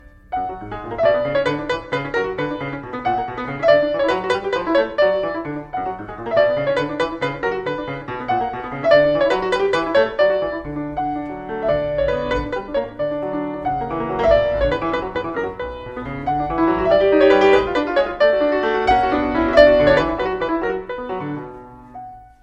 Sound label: piano sonata ringtone; beethoven